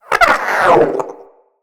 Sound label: Wyrm Idle, Pets, Vocals